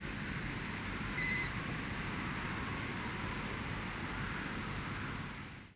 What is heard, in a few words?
nfc wail